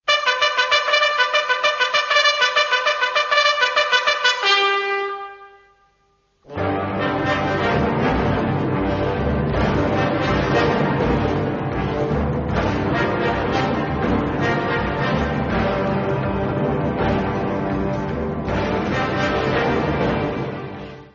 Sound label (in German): Fanfare und Ritt der Häuptlinge; filmova hudba; wilder westen heisser orient